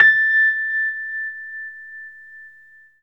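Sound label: Rhodes, A5, left; 3D Sound; 3D Keys VOL-1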